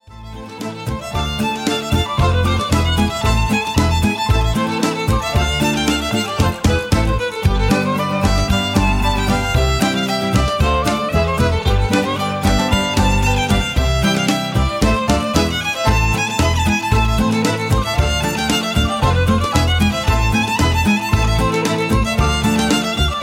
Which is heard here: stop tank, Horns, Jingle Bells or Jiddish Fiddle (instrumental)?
Jiddish Fiddle (instrumental)